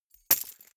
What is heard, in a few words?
key ring large drop carpet medium; objekte; schluessel